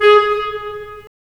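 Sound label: wind clarinet; Roland - LA Composer vol. 3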